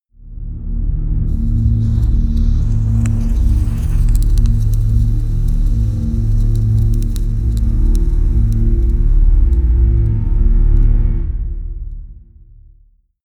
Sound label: promo pad; atmosphaeren umwelt; horror